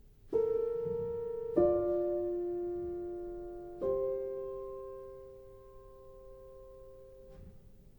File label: Within Fourths Within Fifths